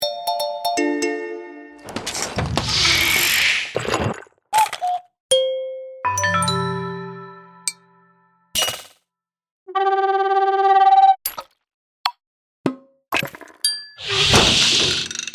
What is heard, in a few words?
organic ui building game sfx; APPlicable Sounds; Main Demo